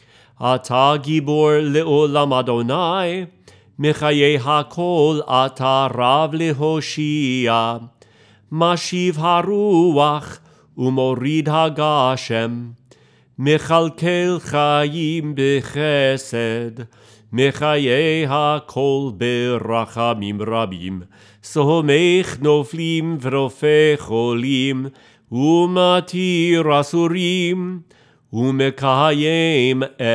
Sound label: Sung; Gvurot